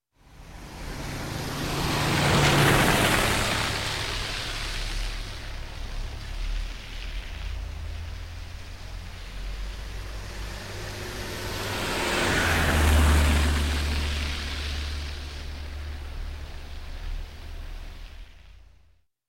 Two Wet Car Bys With Long Aways Close to Medium 30 Mph; Traffic, Sirens, motors